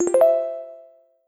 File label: notification generic; noctalia-shell; quickshell